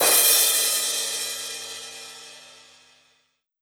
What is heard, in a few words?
Crash, Cymbals